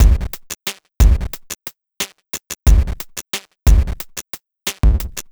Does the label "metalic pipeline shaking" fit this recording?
No